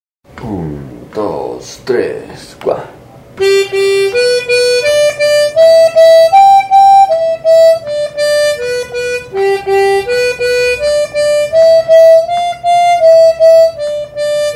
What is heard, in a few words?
boogie rock, armonica